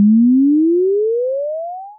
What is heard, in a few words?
sine Raise Pitch Two Sec BWAAH